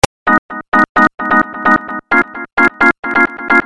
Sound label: Loop